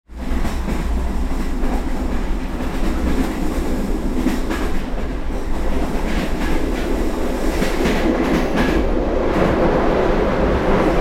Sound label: train decelerating